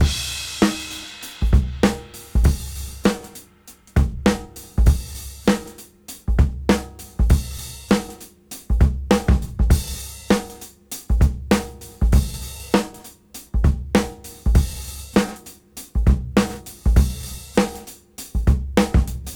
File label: Swing 99 BPM; AMG - Keith LeBlanc Phat Butt Jams